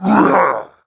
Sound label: fall; nersluck